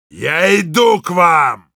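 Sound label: Heavy yell, Russian; «Я иду к вам!»; Насмешки пулемётчика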